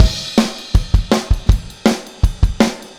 Take Your Toms Off - Chorus - Full Mix, Drums, 162 BPM